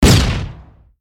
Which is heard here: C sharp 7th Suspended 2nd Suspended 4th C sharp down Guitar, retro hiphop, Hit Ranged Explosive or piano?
Hit Ranged Explosive